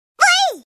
cry; eevee

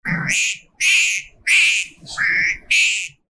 potoo chirps

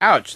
ouch; AoM

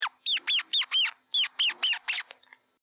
squeak

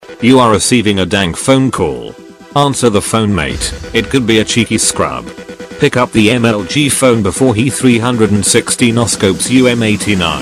ringtone; mlg

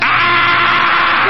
aaaaaaaaaaaaaah

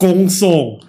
gong song

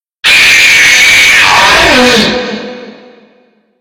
jumpscare; fnaf; ennard

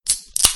beer can opening

